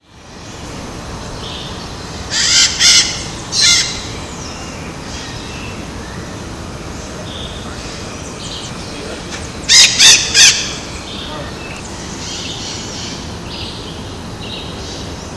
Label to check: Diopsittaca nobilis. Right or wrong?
Right